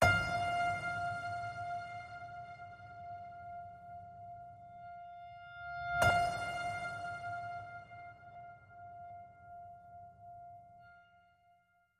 Just A Piano Note F, Alt High

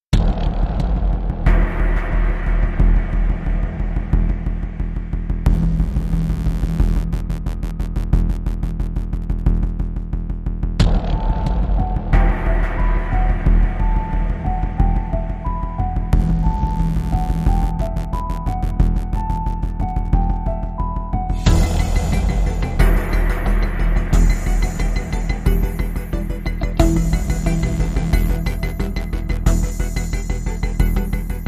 No Theme Arpeggio and Strings

